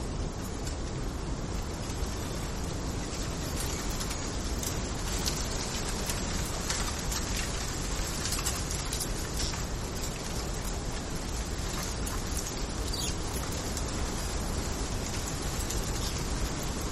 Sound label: Wind